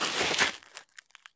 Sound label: stop asphalt; Escape from Tarkov